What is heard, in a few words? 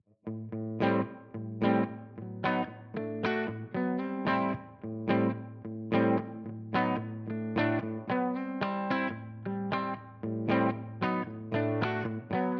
Rat Clean